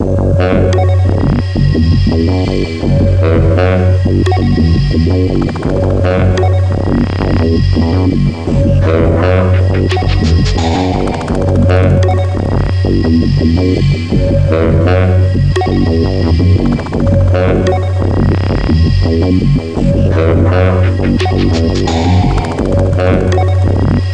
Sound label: Toilet; 8 BIT Pro